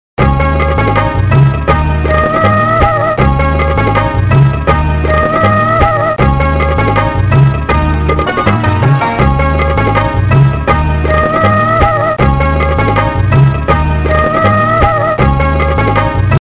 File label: Sitar Groove, Retro Ringtones